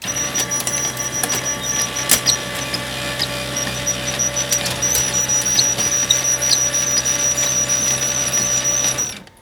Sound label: PHOTOCOPY, OFFICE; AKAI S-Series CD-ROM Sound Library VOL-6